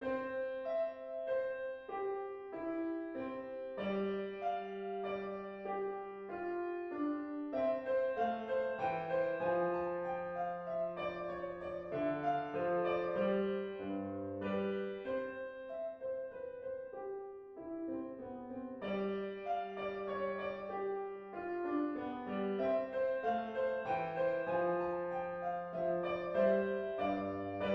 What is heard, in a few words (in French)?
menuet